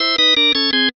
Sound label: game Lose